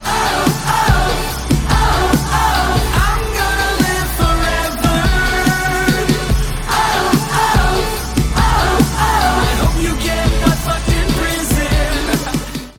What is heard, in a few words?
whoop